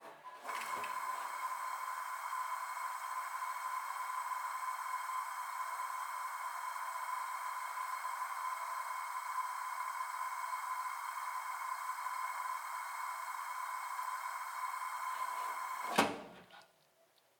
Gas Stove Leak Air Hiss

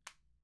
On table

